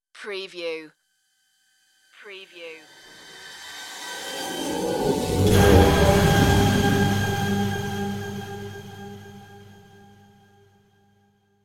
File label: shimmering logo revelation